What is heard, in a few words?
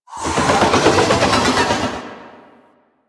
Baby Dragon Vocal Idle; Warframe; Duviri; Duviri Void Dragon